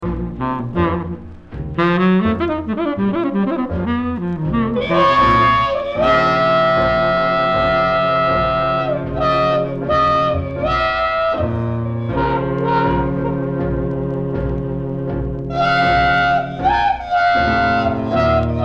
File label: Trumpet solo